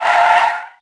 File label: Skids Medium; NASCAR Racers